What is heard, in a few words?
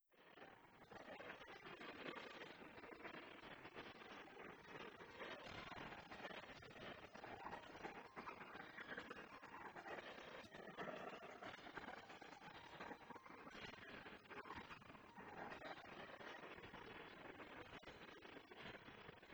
Impromptu in Eb; Schubert